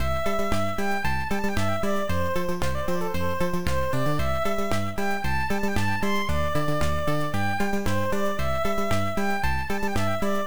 Main Bgm; Ago Board; msx2 scc

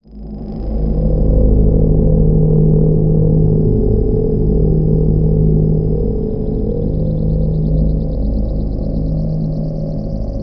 Drone

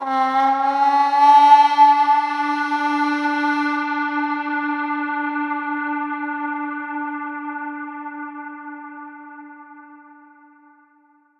Harp Pad; ps vita

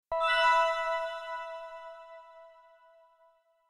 Fountainrecover Cue E; Super Mombo Quest

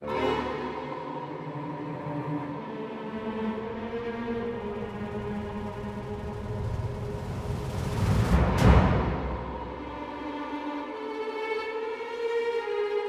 Suspense Stressful

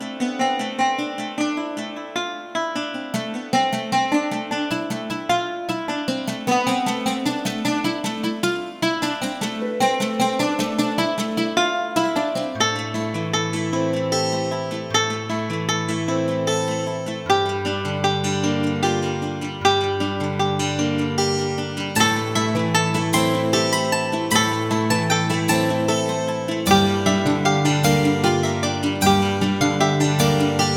Music Undermining Stringed; 7 Mages

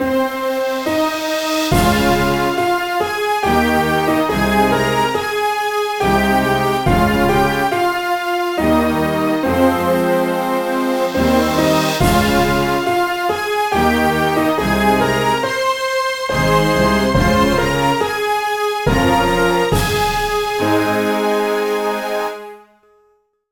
BGM ORCHESTRA

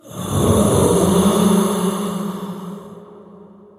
Ghosts Moan; Deadtime Stories

